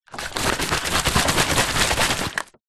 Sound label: foley bottle water shake